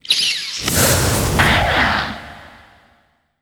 Infernal Kiss; Legend of Keepers

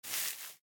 Thick grass

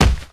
Grizzly Bear

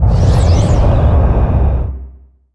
Fire Cast

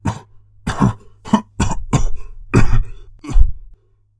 final cough; Lithos

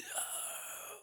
Zombie Chase; Zombie Chase 1

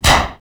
Snap Short Echo

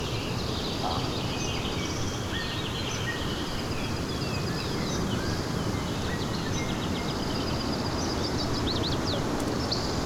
Forest Loop

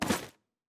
Item Take; Folk Hero

